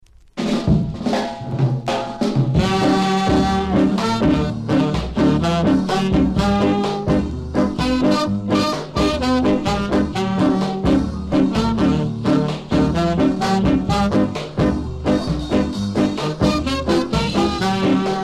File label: ska C